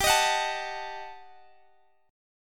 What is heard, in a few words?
Eb Diminished G; Ebdim/G strummed; JGuitar